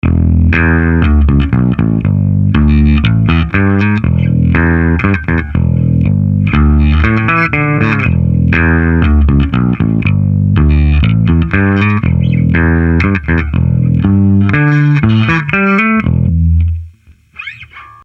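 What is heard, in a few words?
Fame MM 400, guitar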